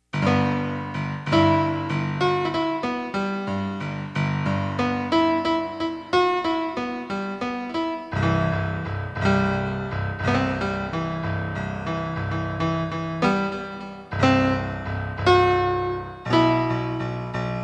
pelog9; tuning survey

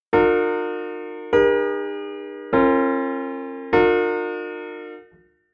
17 cadences, 17-ET

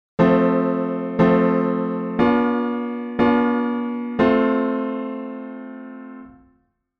plagal cadence; 16 edo theory; 16-ET

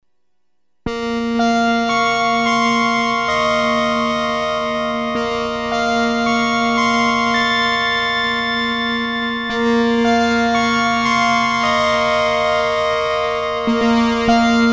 Meantone with stretched octaves

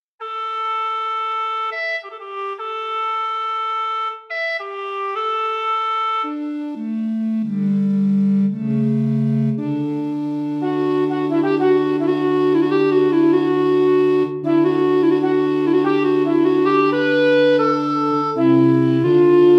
blue ji recorder

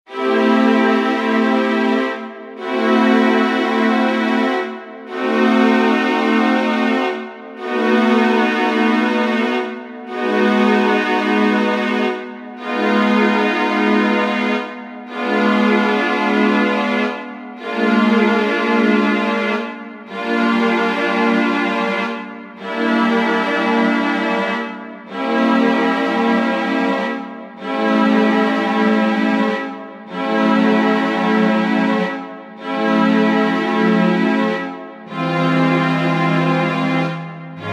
string progression

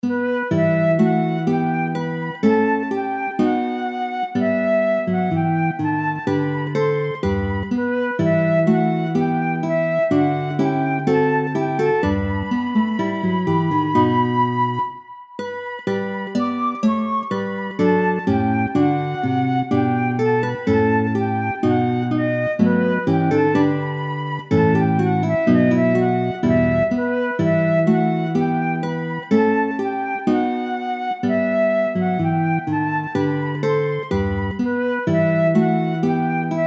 three ravens, 6th comma meantone

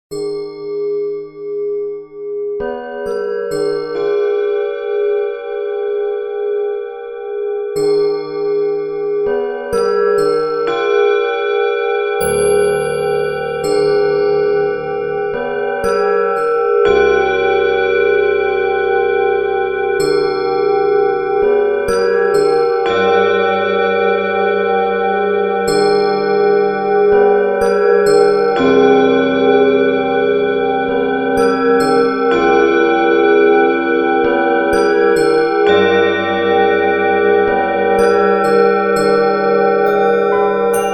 piano tibetan bowls and bells nine eights; 204cent nonoctave

